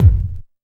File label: KICK deep, Techno Trance DRUMS; Techno Trance Essentials